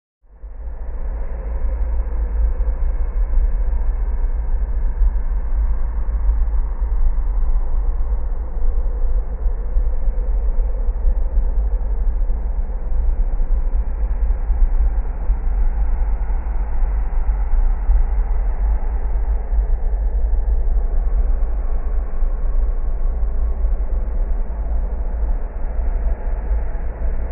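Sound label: Haunted House Ambiance, ghost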